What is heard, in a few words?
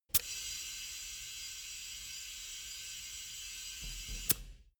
tire pressure control inflate long